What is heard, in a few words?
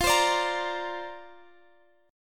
F 6th Suspended 4th F, chord; F64 strummed